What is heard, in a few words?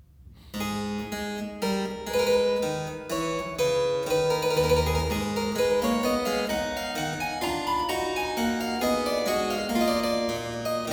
Dreistimmige Inventionen in A minor, Keyboard Works; J.S. Bach; BWV 799; Johann Sebastian Bach; Inventionen & Sinfonias